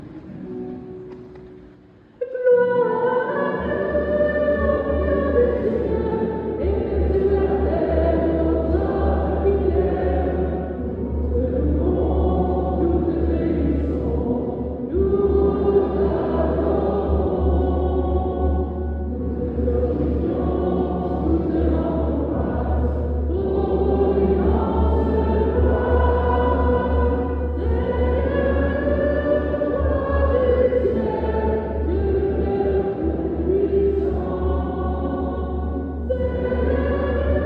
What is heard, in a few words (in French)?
CHANT GLORIA, MESSE; CHEF BOUTONNE 79; EGLISE NOTRE DAME FETE PAROISSIALE